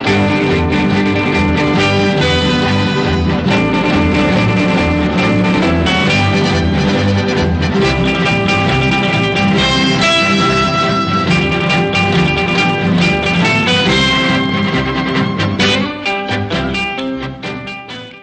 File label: bonanza; dod; joinserver